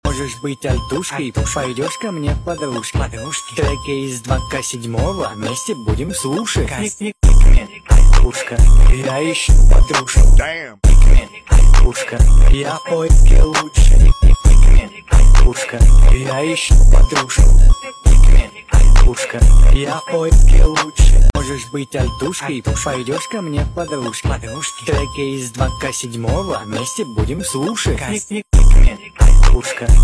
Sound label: hoodtrap remix